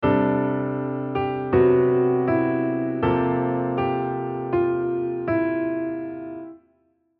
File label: 4th chords voice-leading